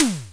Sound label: SNARE, Simmons SDS5